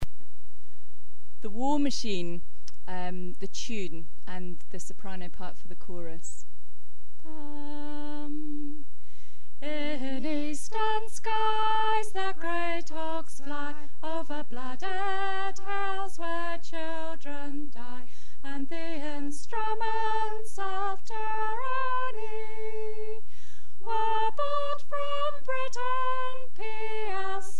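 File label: soprano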